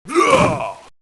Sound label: Vampire Lord Defend Sound